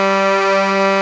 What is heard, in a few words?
ai horn, Truck; Euro Truck